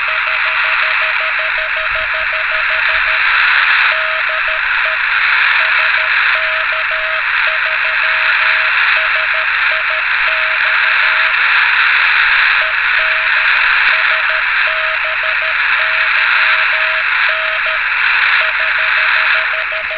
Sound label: beacon, VHF Radio Aurora